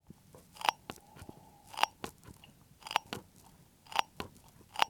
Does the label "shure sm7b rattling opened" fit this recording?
Yes